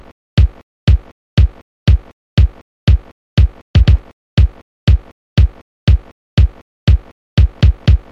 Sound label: 80ies Bassdrum Track